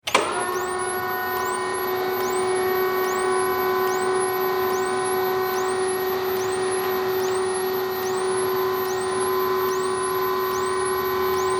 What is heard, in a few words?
Industry Hi Lo Motor On Run Off; Motors; Machines